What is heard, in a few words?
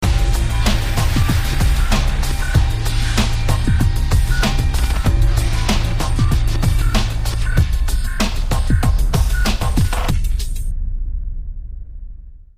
Music mission Success